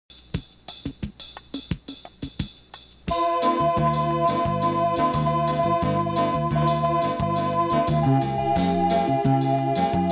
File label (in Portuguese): samba